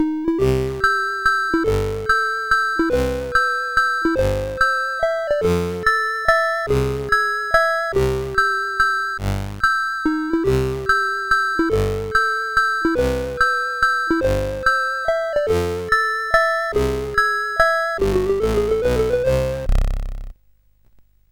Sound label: Space Orbs, sid, CSG8580R5